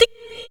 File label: percussion, Severe Gate Kit; Dance Synths